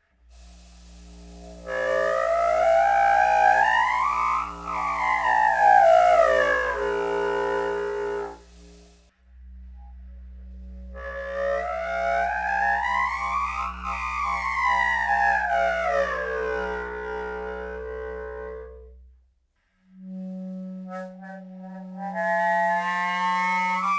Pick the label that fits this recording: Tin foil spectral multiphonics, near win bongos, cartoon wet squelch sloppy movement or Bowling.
Tin foil spectral multiphonics